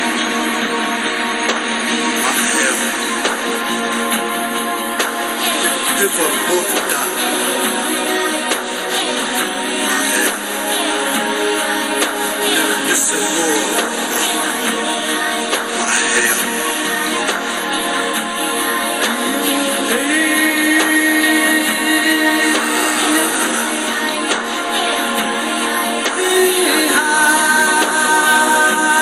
Yoeme Warrior Chant

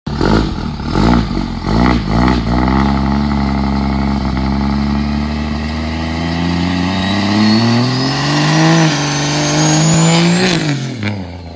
12v burnout

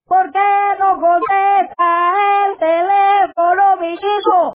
Huevo Bongos

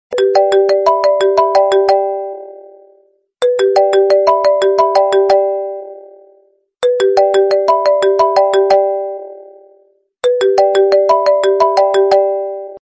Apple Iphone Sms

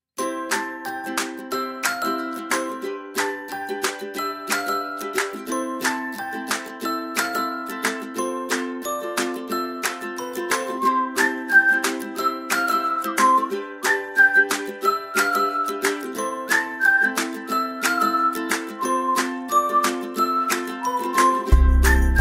backgroung music

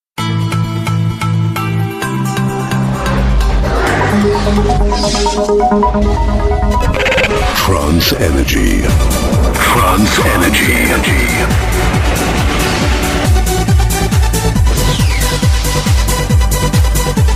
Trance Energy

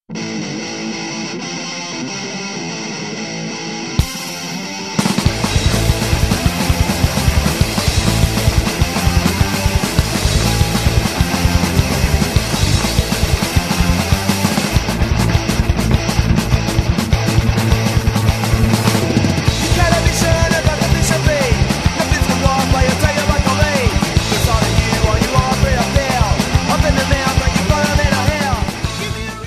Punk

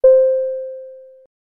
Elevator Bring

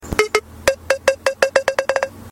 Ball Bounce

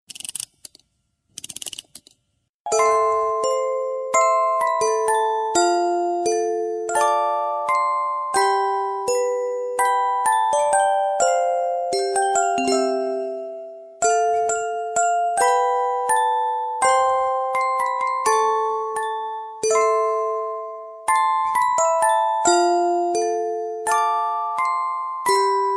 Music Box

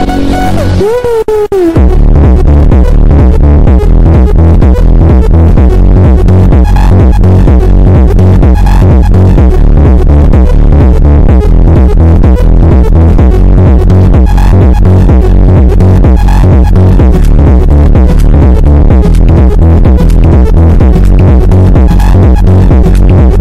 hardbass

